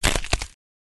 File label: bone crushing